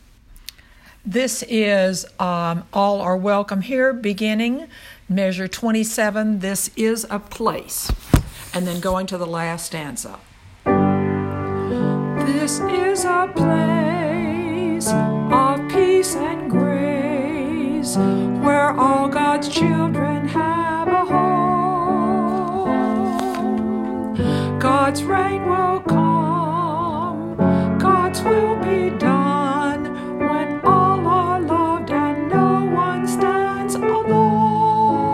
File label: All are Welcome Sop I